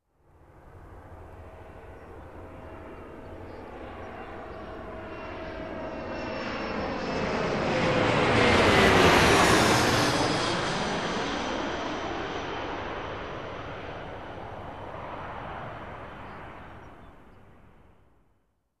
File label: Jet Airplane flying over